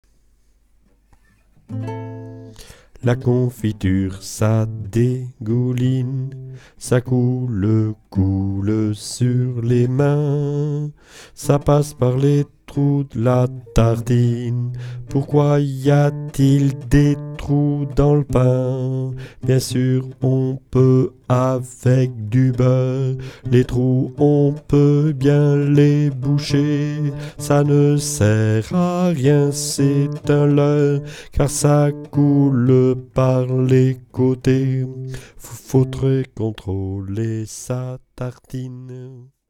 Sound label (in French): confiture basse, chorale; repertoire chorale